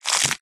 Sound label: animals cow eat grass or foliage single bite; zapsplat